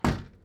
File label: Door Bump